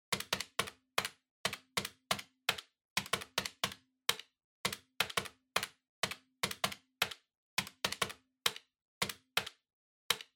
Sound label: Calculator (Desk Pattern)